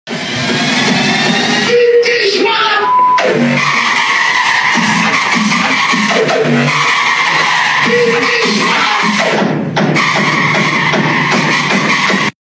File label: Hard Techno